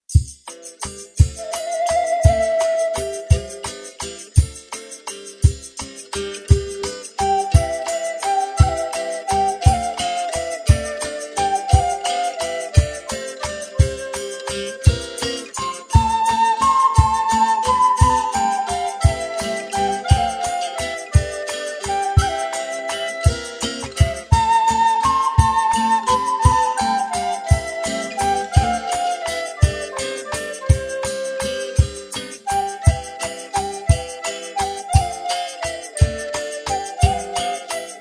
instrumental

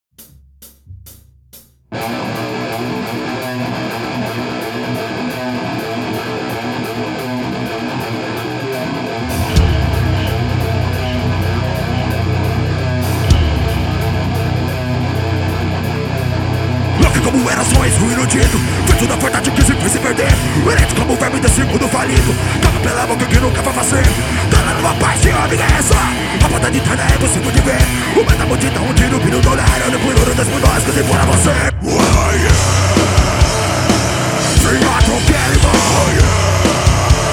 ao vivo no estudio

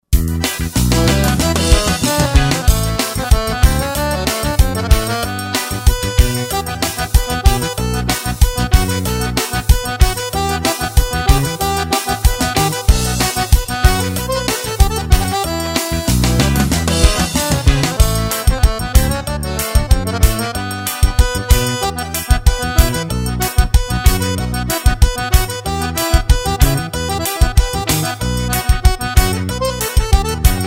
ritmos pe de serra

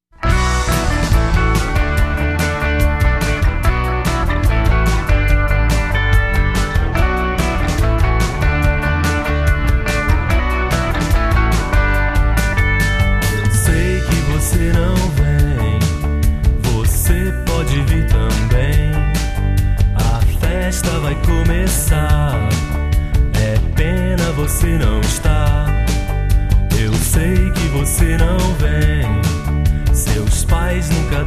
bossa novíssima

